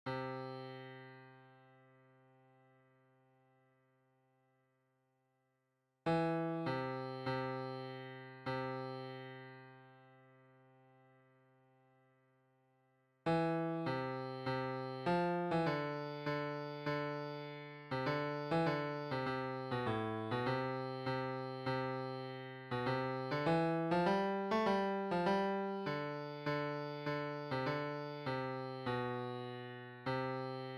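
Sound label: tenor midi piano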